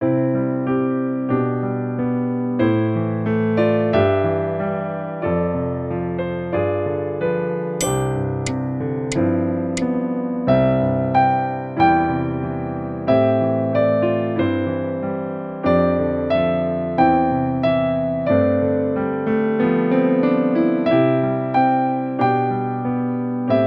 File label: Largo with teacher duet